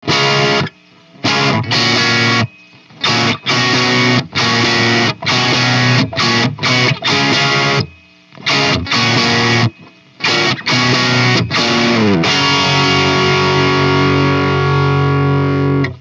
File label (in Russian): Запись микрооконечника через кабинет и микрофон; Микрооконечник для игры в линию версия 2; Classic T